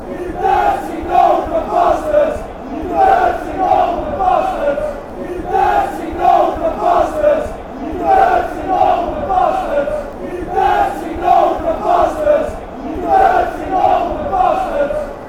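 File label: dirty northern bastards millwall fanchants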